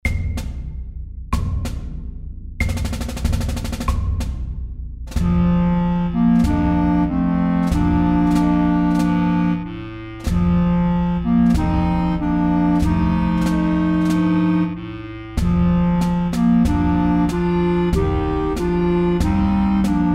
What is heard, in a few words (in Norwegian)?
Juniormarsj, 94